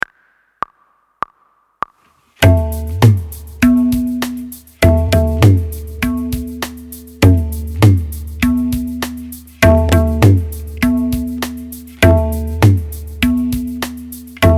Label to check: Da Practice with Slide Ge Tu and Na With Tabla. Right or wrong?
Right